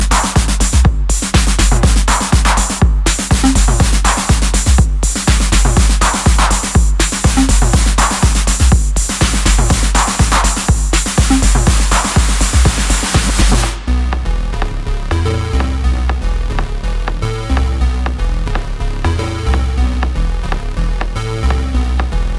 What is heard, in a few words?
Rough Instrumental